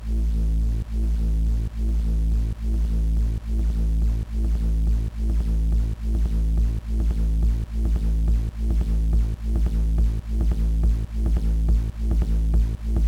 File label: pulse, DigiBoosterPro; Acid Frog